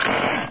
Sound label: r2 d2 head movement; lego star wars